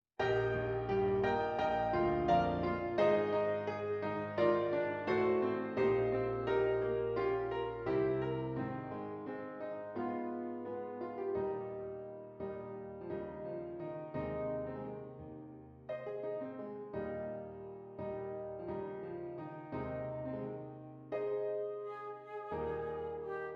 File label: Flute and Piano